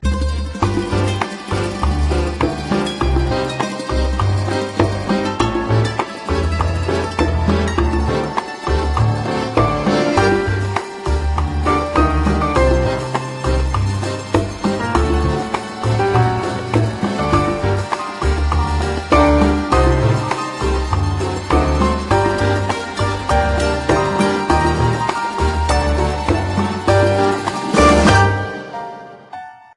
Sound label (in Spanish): Latin Danza del Amor